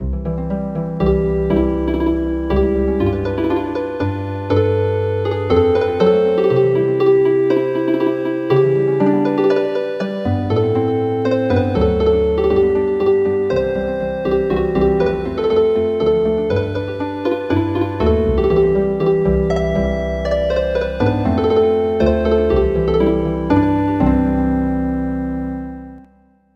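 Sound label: Healing Harp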